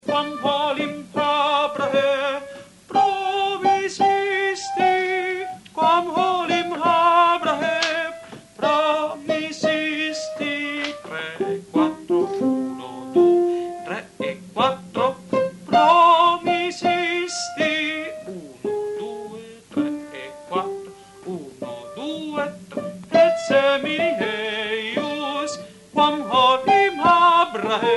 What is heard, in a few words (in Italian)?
Requiem Hostias soprani; Mozart